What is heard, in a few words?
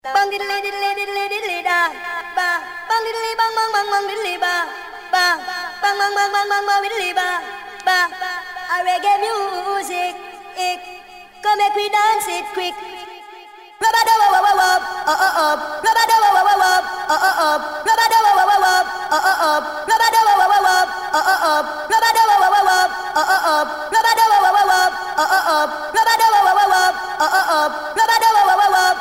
Reggae